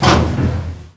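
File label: elevator door interrupt; ModernWarfare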